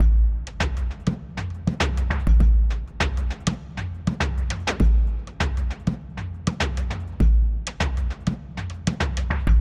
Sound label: Drum Loop Layer